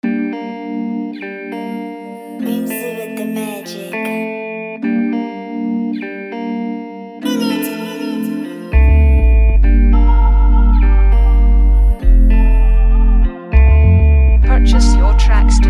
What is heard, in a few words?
Afrobeat Instrumental